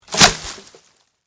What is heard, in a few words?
Log Drop Forest; Wood (Sound Effects:Materials)